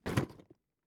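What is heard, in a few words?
sounds of airport baggage carousel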